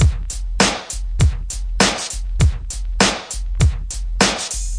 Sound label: deep rnb, drum loops and breaks, 100 bpm; 091 to 100 bpm